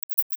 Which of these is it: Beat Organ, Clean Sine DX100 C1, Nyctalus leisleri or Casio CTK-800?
Nyctalus leisleri